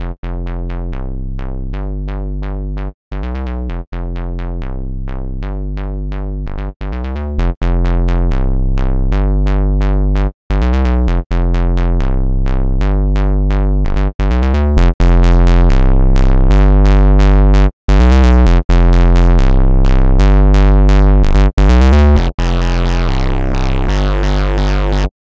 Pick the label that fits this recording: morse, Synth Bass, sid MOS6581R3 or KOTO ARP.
Synth Bass